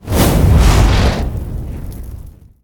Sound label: Flame Breath; Evolve; Goliath